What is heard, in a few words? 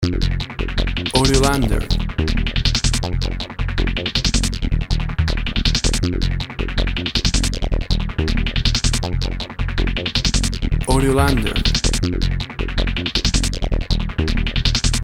Urban Voragine